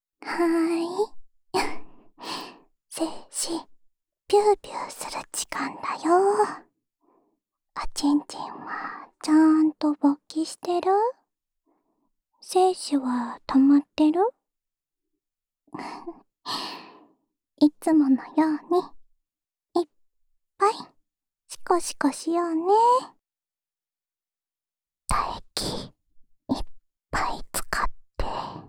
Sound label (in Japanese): オナサポ用耳舐めループ（右耳）, ASMR